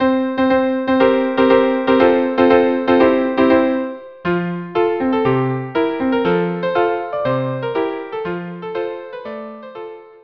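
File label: violin viola cello; squilli ercheggi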